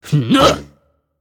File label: Character Ninja Attack; Angry Birds Epic